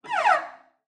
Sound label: Cello Slide Down; SoundsCrate